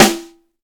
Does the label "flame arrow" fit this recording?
No